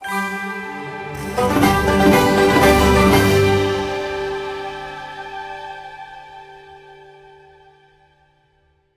stinger Chest Opened Jackpot

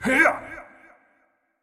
Effort Short; Marv

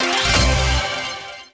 Answer reveal; Buzz! Brain of the World; Buzz! Brain of Oz; Australia; PSP

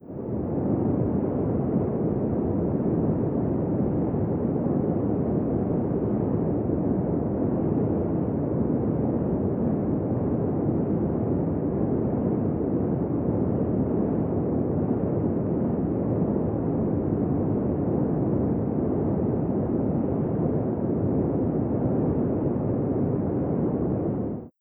Hollywood Wind drone

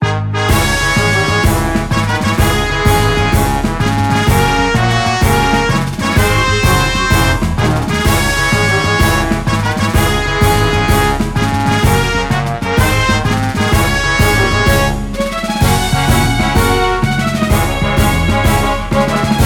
Music event; Power Pro Kun Pocket R

